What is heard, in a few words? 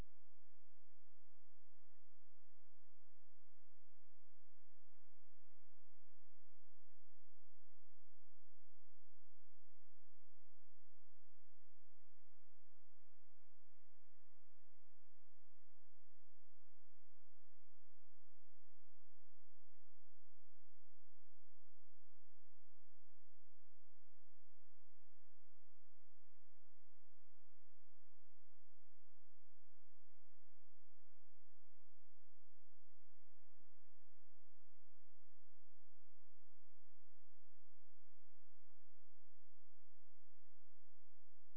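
hydrophone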